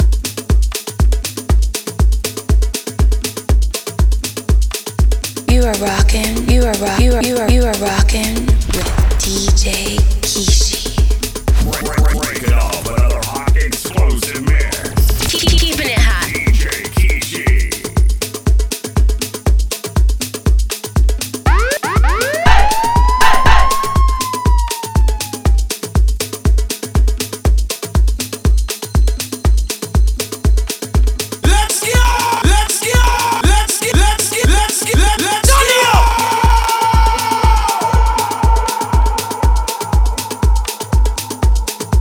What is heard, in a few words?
amapiano afro house afrobeat edm